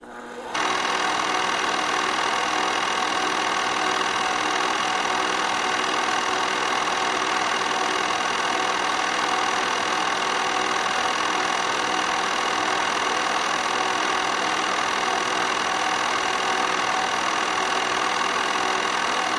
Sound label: 8mm Projector No Fil; projectors; movie & projectors